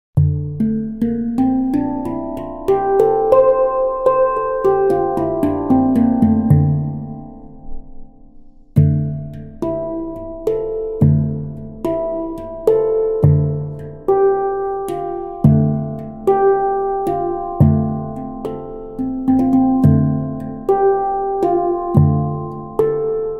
black handpan 10 notes d minor

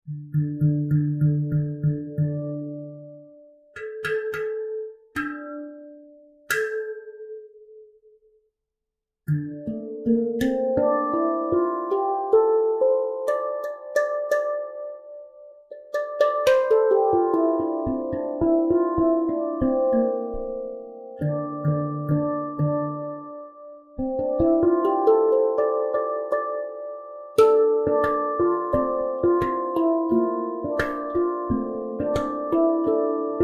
handpan 11 notes deep blue d minor; cosmopan

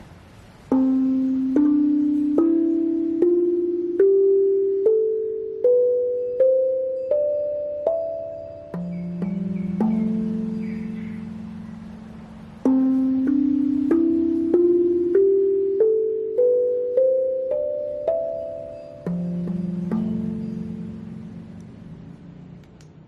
steel tongue drum 14 notes 38cm c major; slapster

